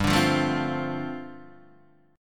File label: G 9th Suspended 4th, Guitar Open D